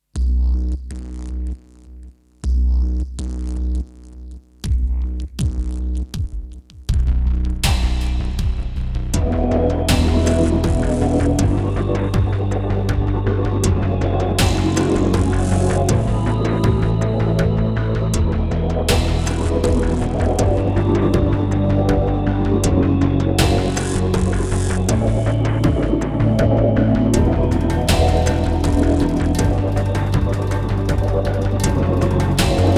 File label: Heavy Tension; Lord of Vermilion IV Original Soundtrack